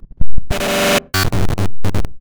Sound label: Spawn Sound